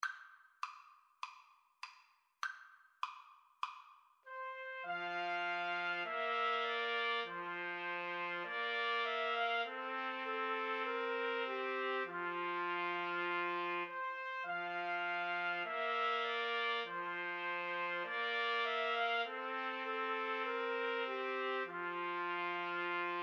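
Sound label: trumpet, karaoke; Trumpet Trio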